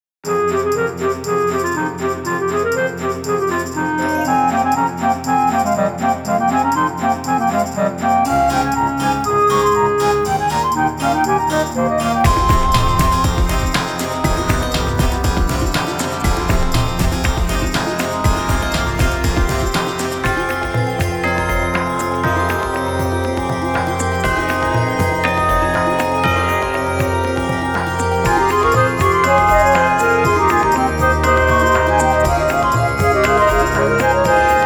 Klarinette; dot hack Link

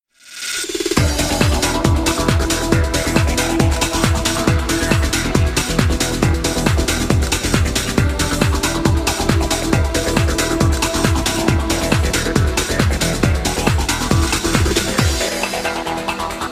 das glockenspiel; FIFA 2002

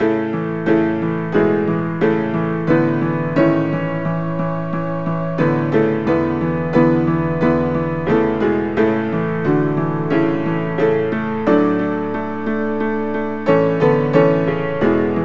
Piano Sonate; Knigge Spielend zum guten Benehmen; NDS

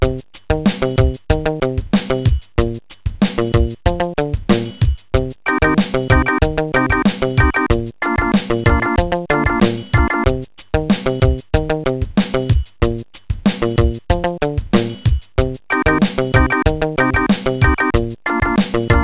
Hafen; Caribbean Disaster; Amiga

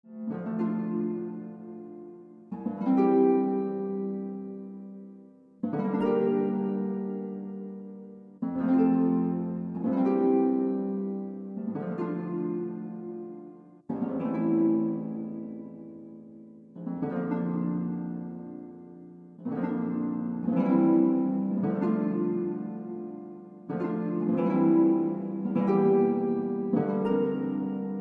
Harfe; Nehrim Am Rande des Schicksals

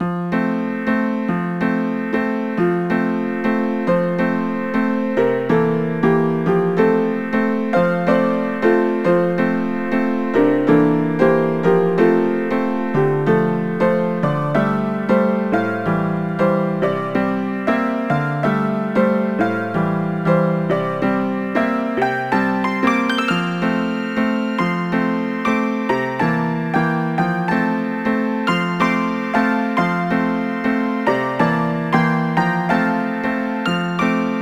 Dance Walzer Medium; Knigge Spielend zum guten Benehmen; NDS

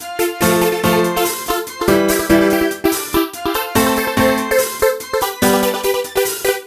Loop Race Auswertung; bibi blocksberg das grosse hexenbesen rennen 2 3ds gamerip 2013

